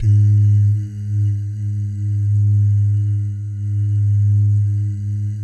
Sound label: BS DOO, Vocal Planet Jazz & FX; ILIO; JAZZCOMBO